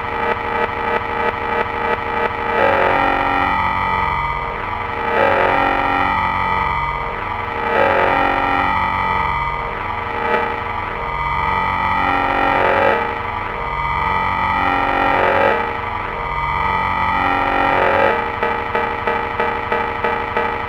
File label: Crawlin Loop